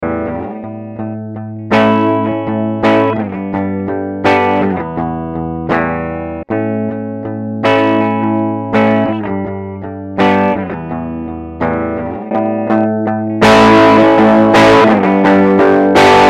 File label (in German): Boss EQ 200 Mid High Verzerrt Post EQ